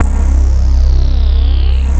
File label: ANA BASS; B VOICE